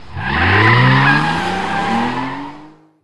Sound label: sprint start, racing